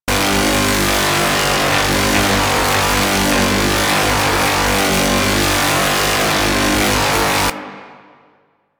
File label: Capital Ship Laser Loop; Warframe; Corpus